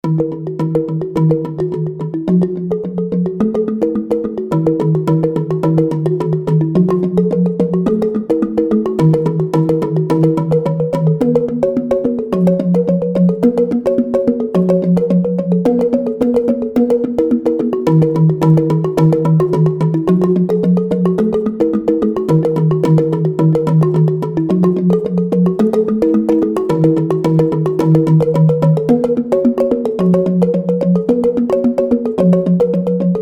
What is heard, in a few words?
Tingklik; Soniccouture; Tabuh Bulan Madu